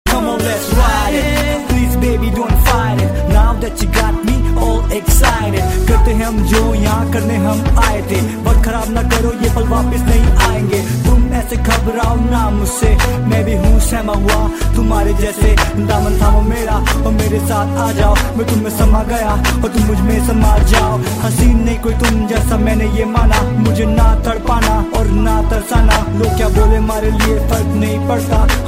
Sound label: indian rap mix; bollywood